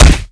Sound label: Boss punch; 100rogues